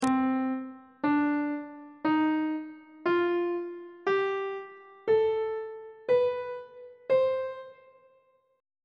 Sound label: c melodic minor scale note positions on treble clef